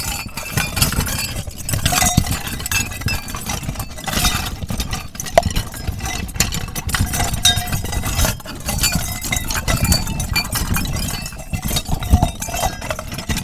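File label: percussion bag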